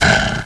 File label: pain; wanted